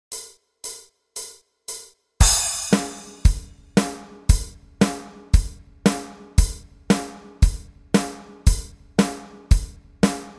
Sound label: bass exercises disco style